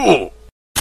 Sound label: uuh sound; minecraft